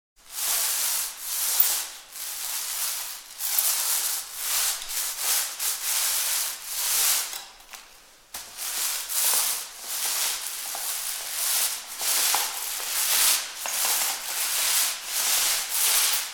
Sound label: Paint Roller, Rolling paint onto a wall.; Hand Tools